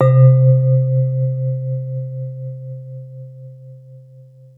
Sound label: mallets